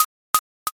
rap noise

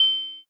shakiin

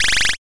fast forward

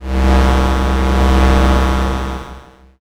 space engine

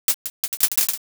firecracker

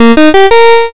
start

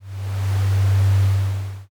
planet ambient

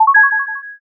success

